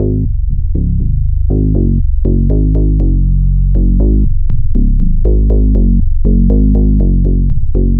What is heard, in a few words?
Bass Line; Eclectic Electronica